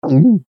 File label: gulp noise